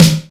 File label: TICTALK DRUM